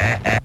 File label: laugh evil ha ha; ooh ahh